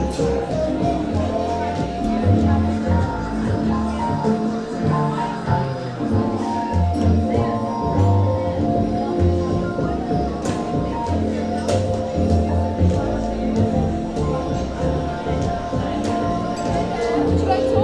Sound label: live jazz